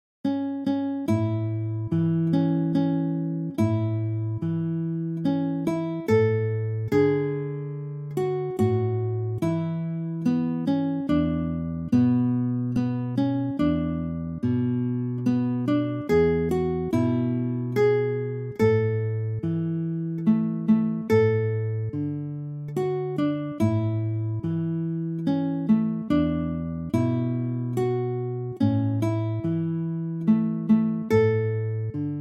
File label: lullaby wiegenlied guitar; brahms